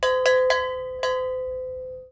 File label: cowbell ringing, percussion; percussion fact file